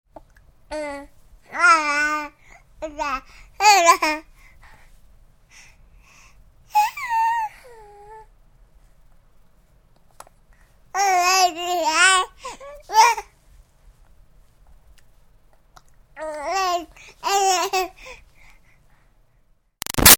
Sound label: Baby 6 Month Old Talk Happy; Voice; Babies; BigRoomSound